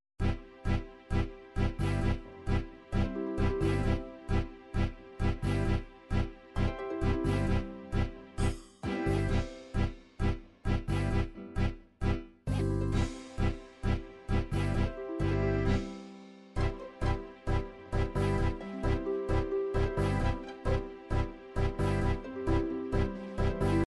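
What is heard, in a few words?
tango argentino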